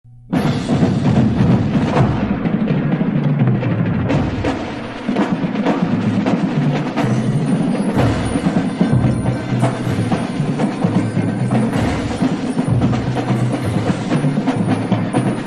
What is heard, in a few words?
Percussion Solo